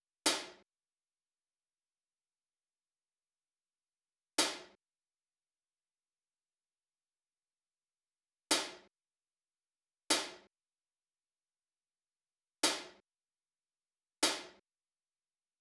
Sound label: hat